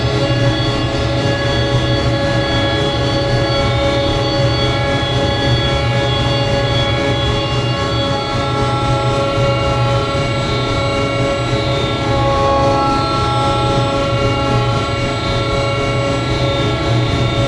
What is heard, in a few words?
Robot Arm Track Fast Close; Boomtown; Titanfall 2